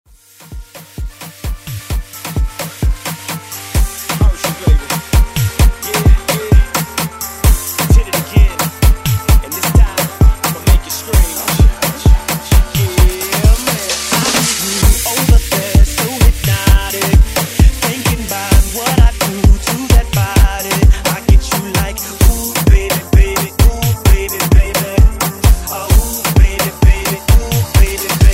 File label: Afrobeat Redrum